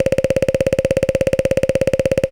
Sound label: bird woodpecker